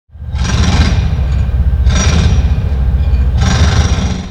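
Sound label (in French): Marteau piqueur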